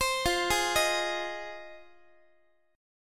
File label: F Minor 7th F Guitar; JGuitar